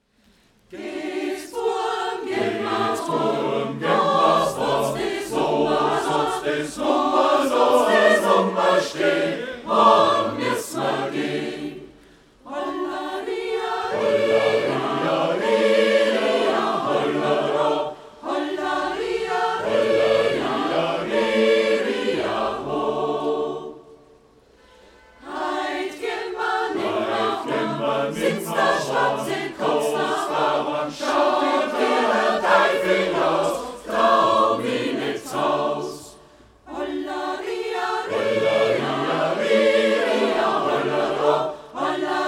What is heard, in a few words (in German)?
Chorgemeinschaft; Chorgemeinschaft Freistadt und Hans Sachs Chor Wels; Gehts Buam